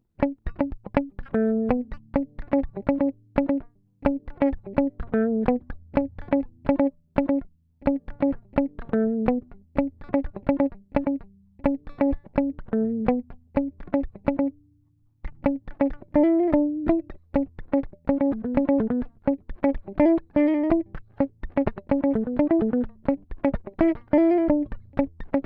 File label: 126 Bm Elec Guitar